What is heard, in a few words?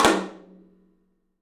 OPEN TOM; 3D Sound; 3D Drums